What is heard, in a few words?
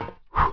ladder; PC Gamer IT CD 41; Daikatana